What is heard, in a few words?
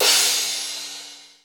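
processed cymbal crash, drums; Crypton - DrumBase5000; PROC.DRUMS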